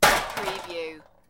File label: Metal Debris Impact Short Medium